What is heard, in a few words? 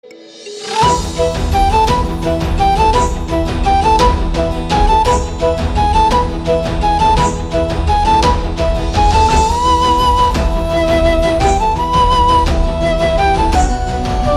Flute music